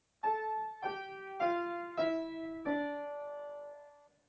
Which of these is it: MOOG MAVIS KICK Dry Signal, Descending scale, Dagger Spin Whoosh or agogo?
Descending scale